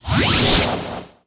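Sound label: Summon Synchro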